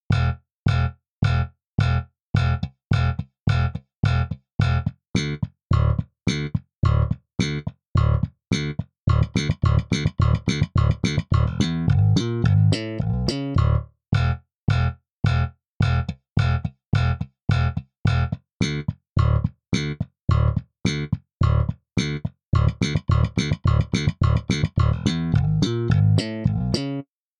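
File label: Studio Bass, default setting, slap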